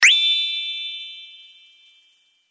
Message zinggg

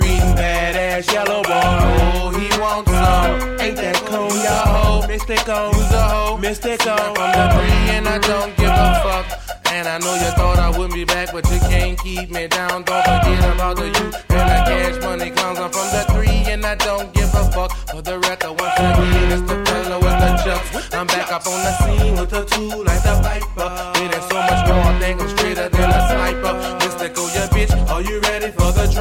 bad bone sms, rock

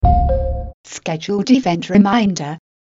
calendar event

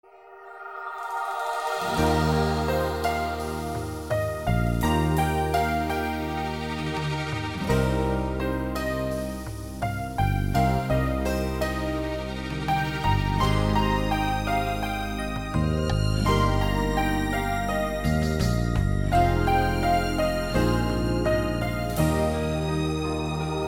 Soft Alert

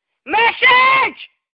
Screaming Message

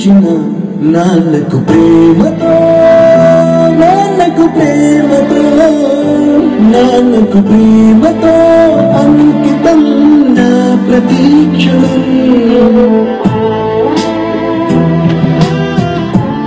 BGM; NANAKU PREMATHO

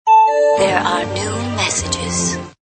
new message

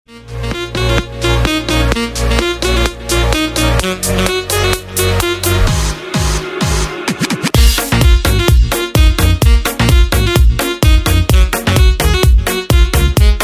drums remix; electronic

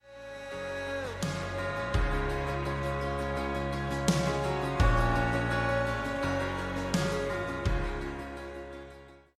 Bells

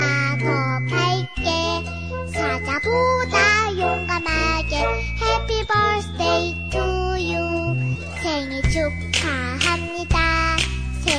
happy birthday song

